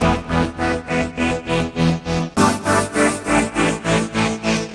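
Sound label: Pad Tremolo chords, Drum & Bass Pads; Sonic Foundry (Sony Creative Software); Knutrix Drum & Bass; Keys Pads 1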